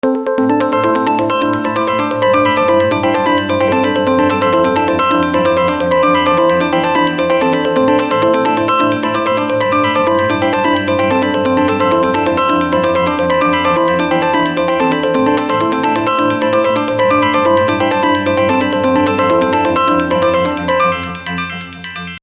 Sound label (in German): klingelton